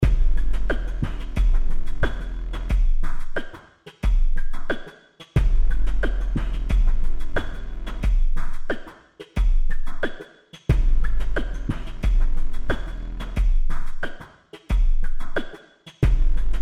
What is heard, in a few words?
E90 Rhythmic Groove